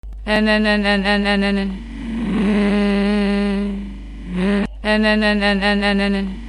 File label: pigeon